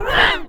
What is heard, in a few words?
shakey kid roar